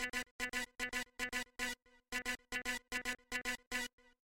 Percussive Synth

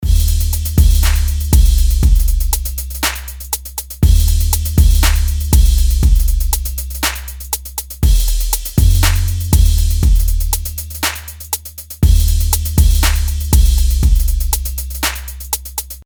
southern drum loop example

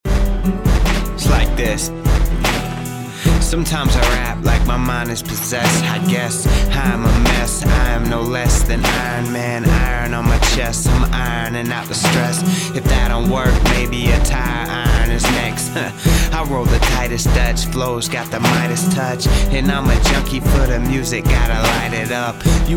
octave lo rap

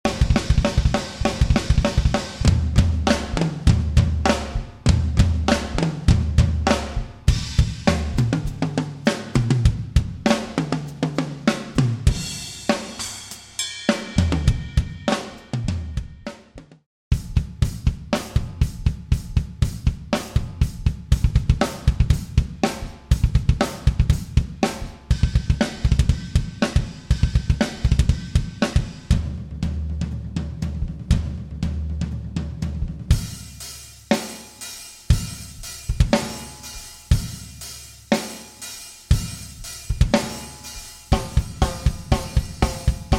mega metal drums

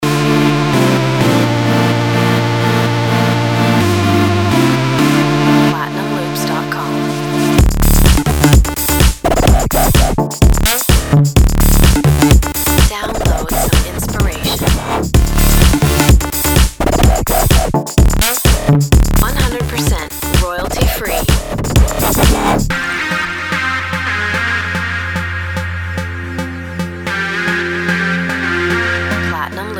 edm samples

